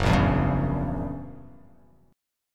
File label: Gb 7th Flat 9th, Guitar; strummed; JGuitar